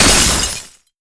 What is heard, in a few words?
Hit Heavy Ice; netent